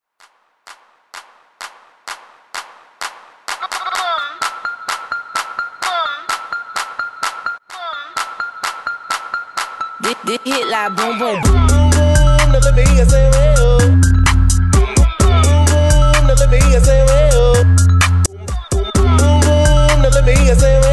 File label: BOOM BOOM BOOM CLAP STARTER 64 BPM